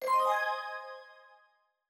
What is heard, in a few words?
level Up